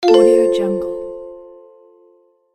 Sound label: approved interface sound